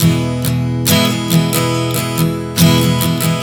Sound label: Strum 070 E, One Chord Strumming 070 bpm; Sonic Foundry (Sony Creative Software); Songwriter Acoustic Guitar Companion